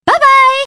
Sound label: Wind Run